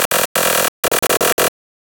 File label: glitch error malfunction; sonniss gdc